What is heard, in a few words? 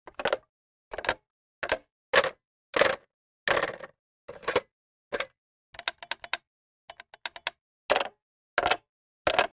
Telephone Hang Up Noise